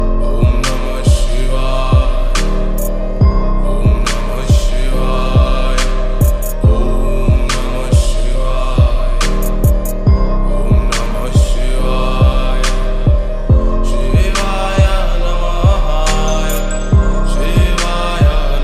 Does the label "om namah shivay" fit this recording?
Yes